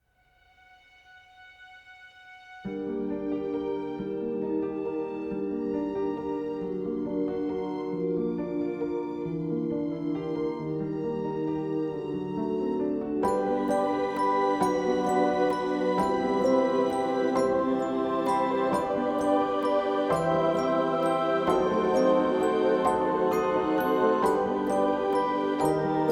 End Credits Instrumental

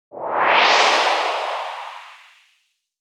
Stinger 'Shield Regen' (text message tone); Payday - The Heist

